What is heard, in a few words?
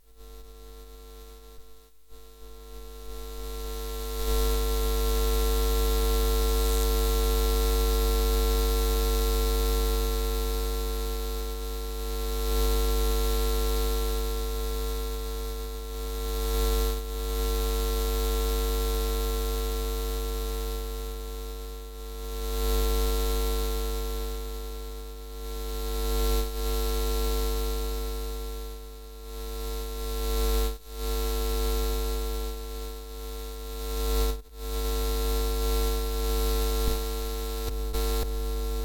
Interference - Cable - Buzz Hum - Swells to Sputtering Noise, Electric Guitar Pickup Interference; Pole Position